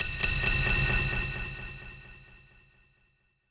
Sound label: BGM stinger, BGM Player Dying Out; Babylon's Fall